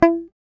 GUI MUTE, E-Gui Muted; Sound & Vision; Gigapack I CD 2

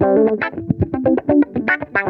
DIZZY, Funk Guitar; 90 s Sample CDs; Zero G

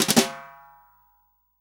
SD, REAL DRUMS, SWING KIT

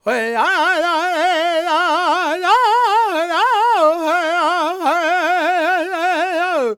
World Voices, Lakota

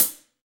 HH, Kits, Rev

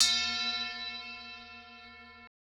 Dazed, Classic SFX, cartoon; musicradar; cartoon caper

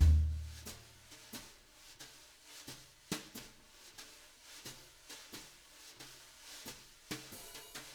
BRUSH LOOPS, Living Drums

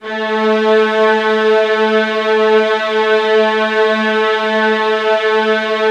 VIOLINS B, Strings; ILIO; Synclavier Strings

